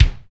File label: kick, natural kick; Roland L-CDX-01; KIK Natural K1